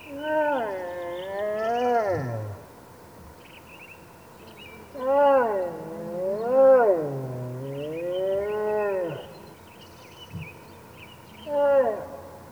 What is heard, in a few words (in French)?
l'ours noir, mammifères